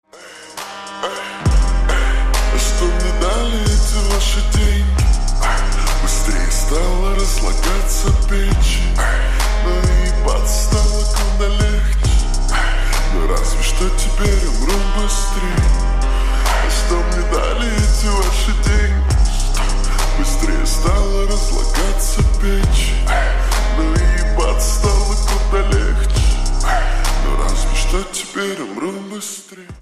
slowed reverb

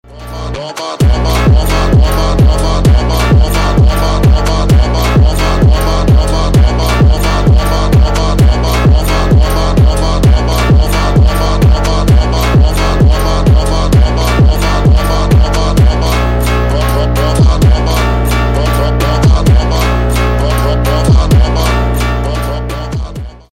phonk

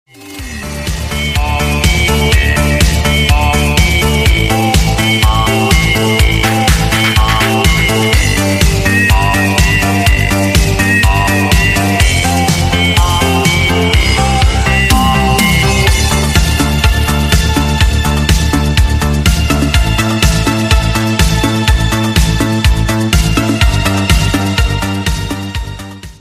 electro

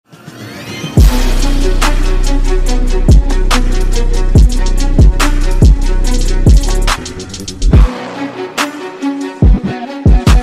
trap remix; Grand Theft Auto 4

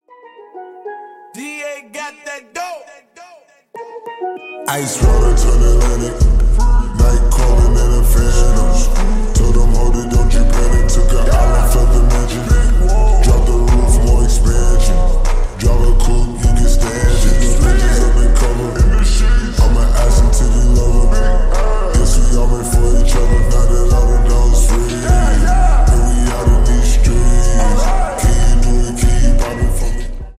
slowed reverb

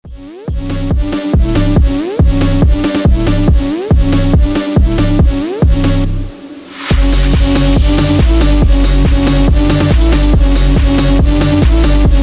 phonk

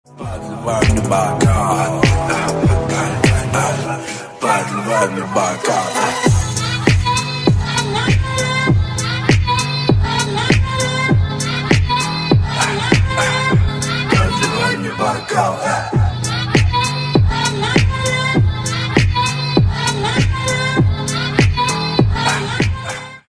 slowed remix; tiktok

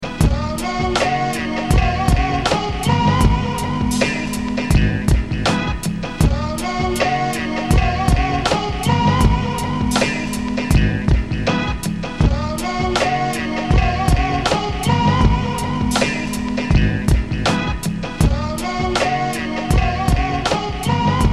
instrumental

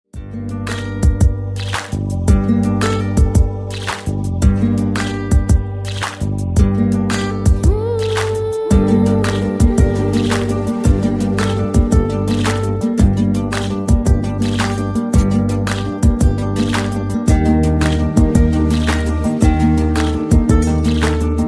guitar

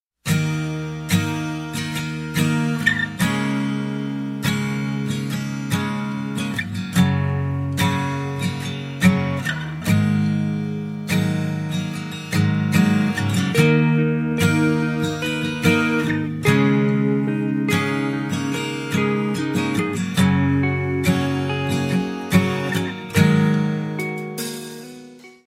instrumental

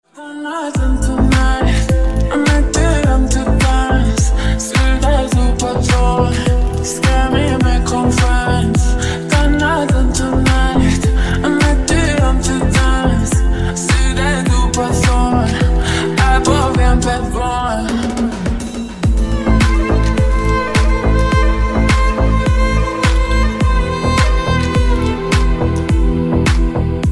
relaxing vibes

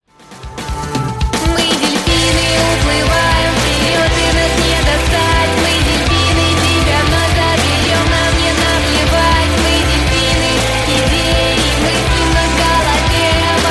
rock version

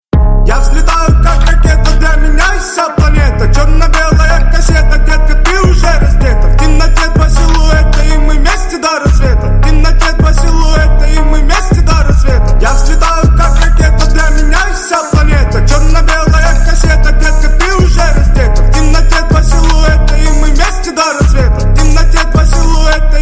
bass boosted remix

